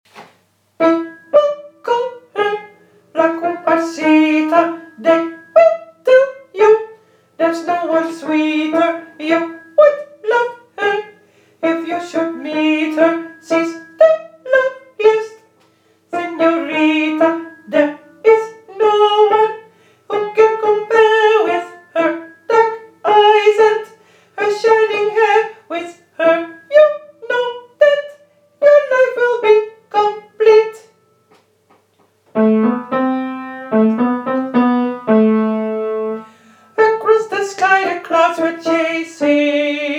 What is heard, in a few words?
La cumparsita sopraan